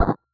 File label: Perc, Data Mosh Hits; Other Perc; 99Sounds; Mangling Audio